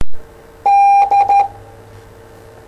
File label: Morse D